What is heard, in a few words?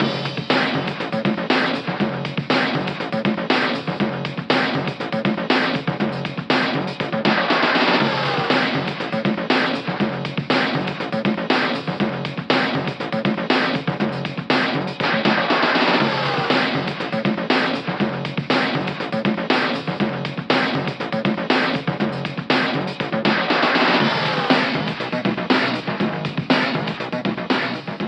BoomBox loop